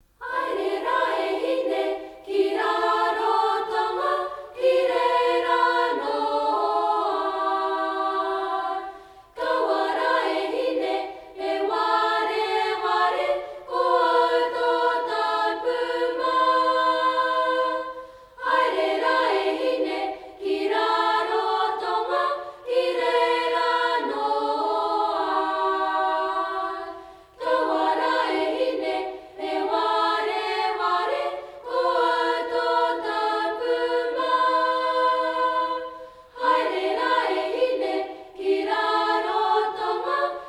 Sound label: haere ra e hine action song